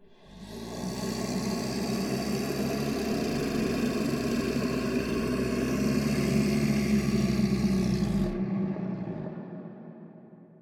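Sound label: ambience bad creature moan; smite; conquest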